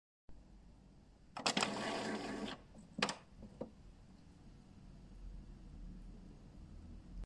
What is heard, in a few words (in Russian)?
Выключение струйного принтера